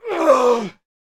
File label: Pike Death Vocals